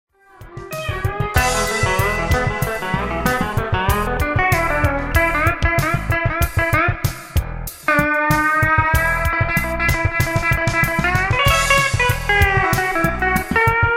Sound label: Funk